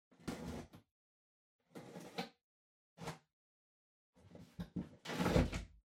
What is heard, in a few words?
Cardboard Box Far Unbox; ダンボール; 遠い 開封; 梱包材